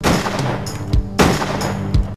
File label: death; singe